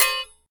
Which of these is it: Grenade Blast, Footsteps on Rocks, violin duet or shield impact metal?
shield impact metal